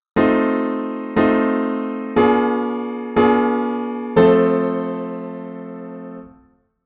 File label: plagal cadence; 16 edo theory; 16-ET